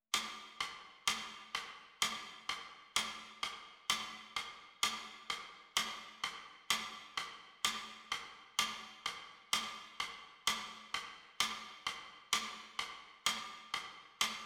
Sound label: Fanfare Flex Band Click Track 8 beat intro